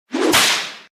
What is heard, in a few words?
whip sound effect